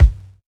tight low end kick g key

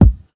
smooth steel kick drum a sharp key

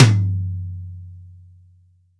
acoustic tom g key; tom drum samples

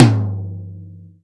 long tail reverb tom sound g key; tom drum samples

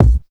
smooth bass drum a sharp key

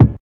old school studio kick drum one shot a sharp key

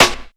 good acoustic snare sound c sharp key; Duration 0:01; snare drum samples

